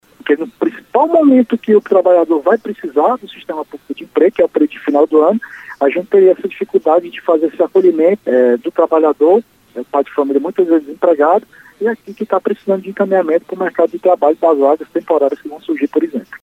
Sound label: sine